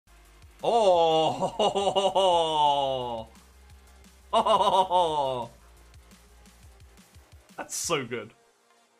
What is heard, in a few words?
ohohohohoh